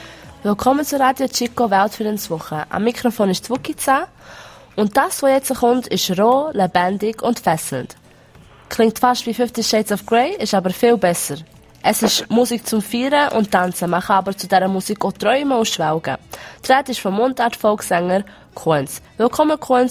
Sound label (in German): Mundart Folk; Weltfriedenswoche